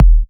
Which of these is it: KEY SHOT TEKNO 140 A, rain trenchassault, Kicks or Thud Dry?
Kicks